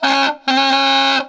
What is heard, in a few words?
Light Street Amb; Levels Hub Diner 02 Light Buford; Light Street Amb Honk